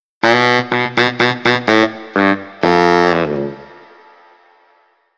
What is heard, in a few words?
Bari Sax